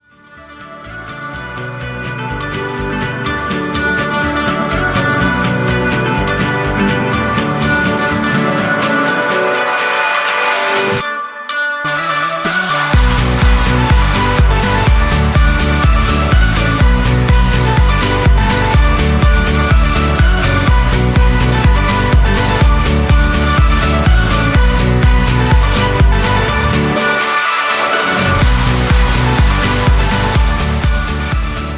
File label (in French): sonnerie